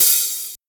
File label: present open high hat a sharp key